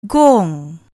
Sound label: gong